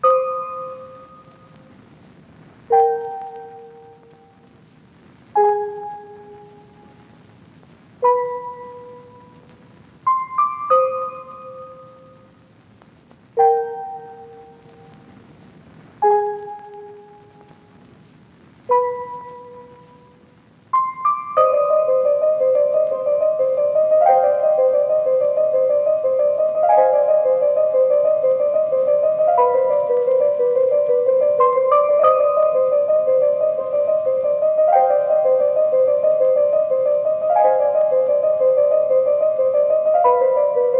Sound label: OP1 TYPE BEAT